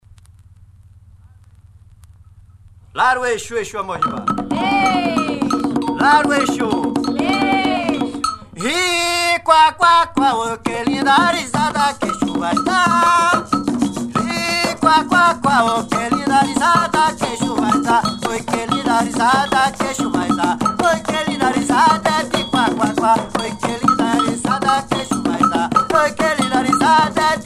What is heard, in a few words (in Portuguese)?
risada de exu